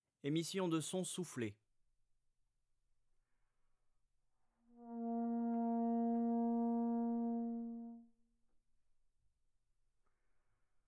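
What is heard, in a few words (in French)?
émissions de son soufflés, trombone tenor trombone basse; Effets; Effets d'articulation; traite orchestration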